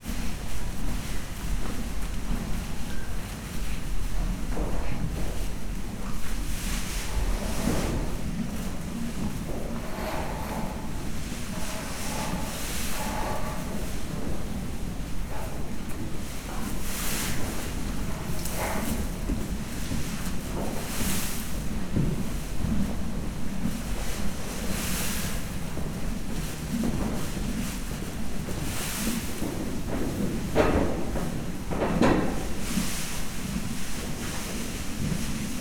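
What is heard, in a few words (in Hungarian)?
szalma zizegése mászkálók, ló; Hortobágyi Nemzeti Park; máta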